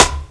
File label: Fat One; EMU MoPhatt